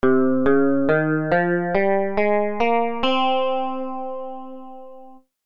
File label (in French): mode do phrygien